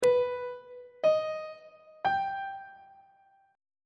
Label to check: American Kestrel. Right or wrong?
Wrong